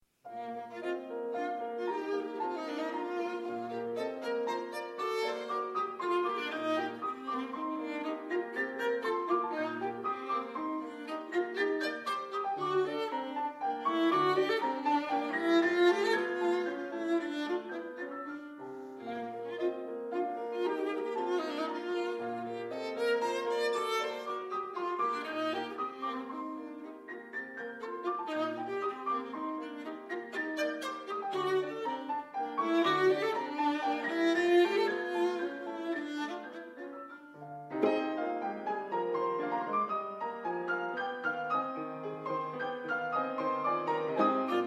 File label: Viola Sonata